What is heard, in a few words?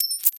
coin flip; goldentavern